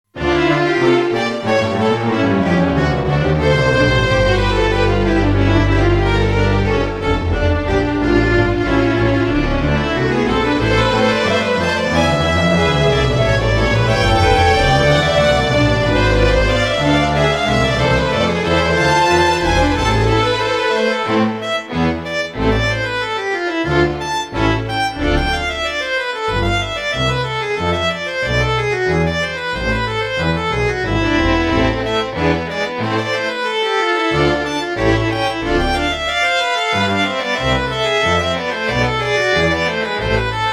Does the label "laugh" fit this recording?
No